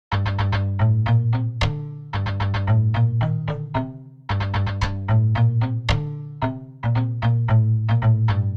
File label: Zither Strikes Melody G Minor 112 bpm; MIDI Clips; Tonal; Ableton; Factory Packs; Lost and Found